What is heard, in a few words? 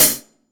Present Hat Sound Sample D Key, hat one shot tuned to the D note; hits one shots; hat samples